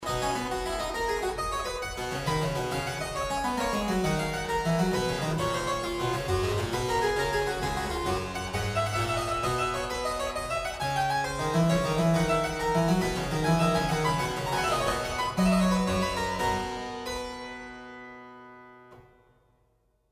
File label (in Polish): TOCCAT na klawesyn solo